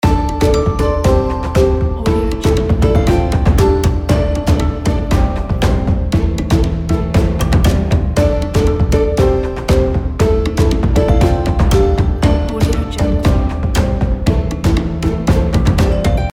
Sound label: china soft upbeat loop; gamechestaudio